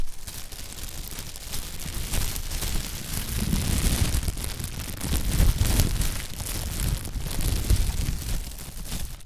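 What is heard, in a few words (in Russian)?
пузырчатая пленка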